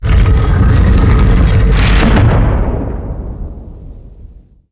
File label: door close; DAoC